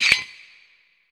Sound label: tech fx; Best Service; Hyper Dance; EFFECTS